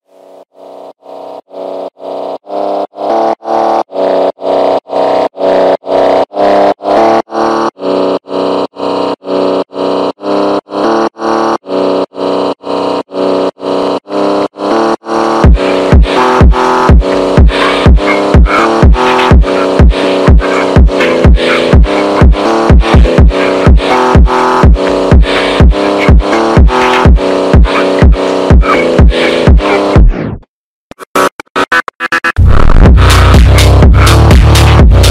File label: phonk